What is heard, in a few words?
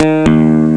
pick bass; Instruments; bars&pipessoundkit1